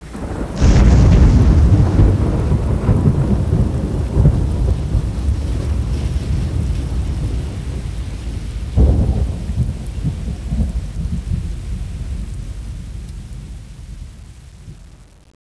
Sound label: thunder